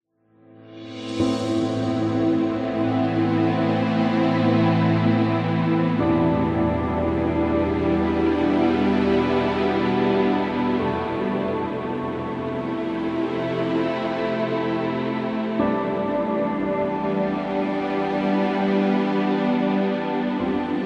Sad Emotional Dramatic

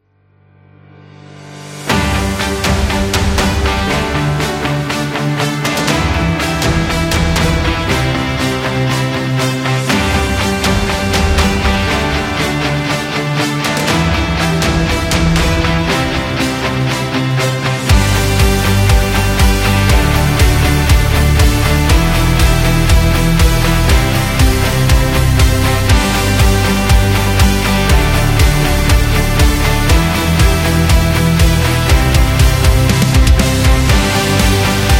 Motivational Rock

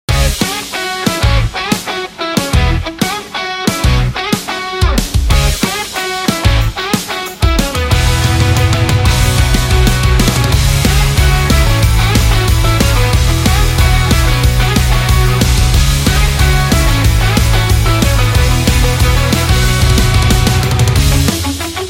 Energetic Indie Rock

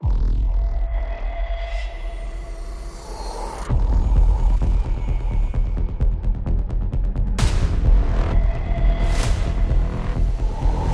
Trailer Intense, battle drum

